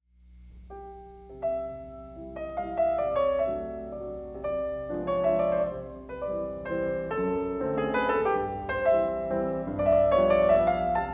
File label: Mazurka